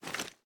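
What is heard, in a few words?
pistol 1911 reload lift; gmod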